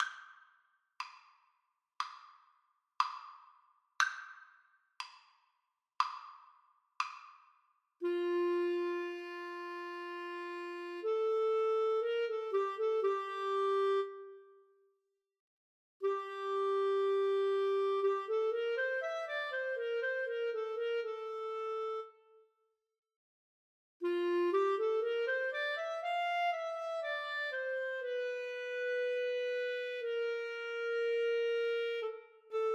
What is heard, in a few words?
Clarinet Duet